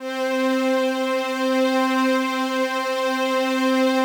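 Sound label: PAD, atmospheric pads; Best Service; XX Large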